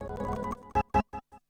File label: Industrial 160